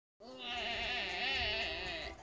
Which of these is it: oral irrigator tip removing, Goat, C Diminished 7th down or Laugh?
Goat